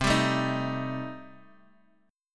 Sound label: Eb 6th Flat 5th C